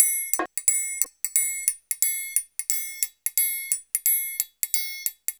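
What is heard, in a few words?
TRIAN, triangle, Modern Percussion Loops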